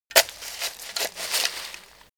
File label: Shovel Dig Dirt